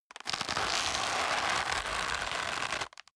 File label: leather creak hard